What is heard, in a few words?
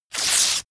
sheet clean tear up fast